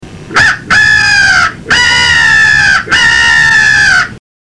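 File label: comeback, The Come Back Call - Version 3, crow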